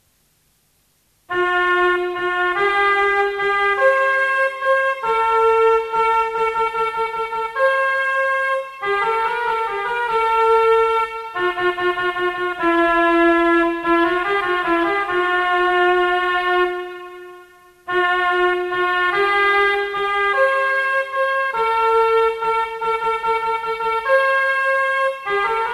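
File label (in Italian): Canone buona sera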